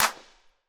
clap

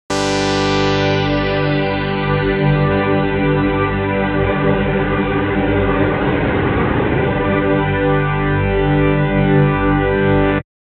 chord shifting params

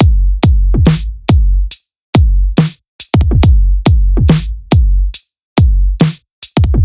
drum loop; monosounds

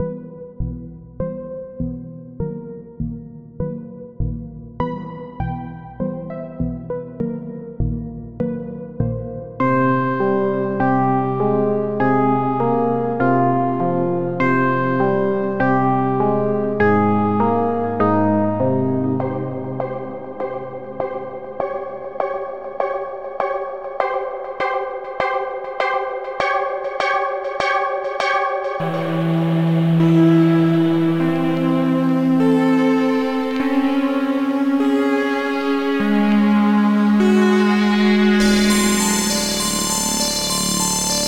keys and leads; serum particles

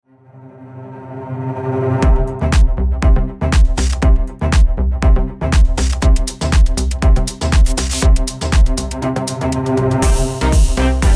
modern club

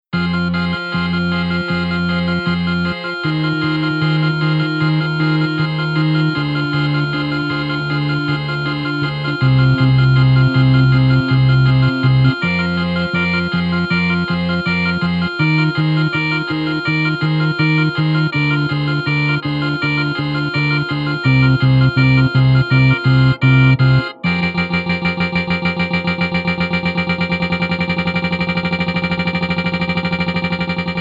valve organ

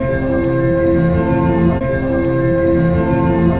133 bpm; spring kiss iii

